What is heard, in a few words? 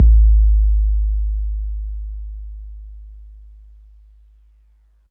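bass drum 808 MPC60 Nice G#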